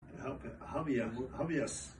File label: hahabejas